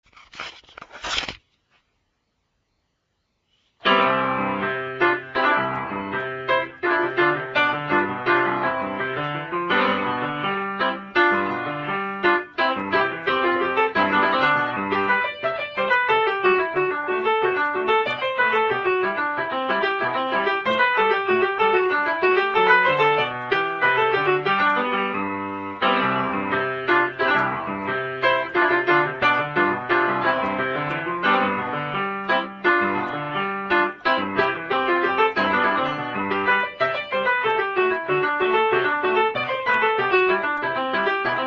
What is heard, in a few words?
BWV933 piano